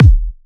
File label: Kick, 128BPM; Jelly Kit